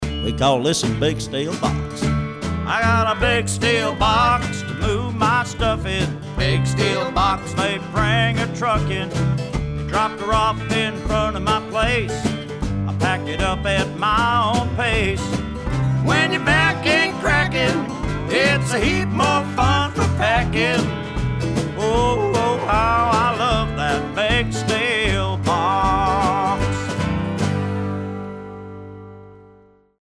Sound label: Hurtin' Country Track